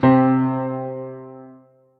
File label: Piano; Krubera; Broken Keys